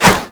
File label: Whoosh, weapons; Infested; Coda Hirudo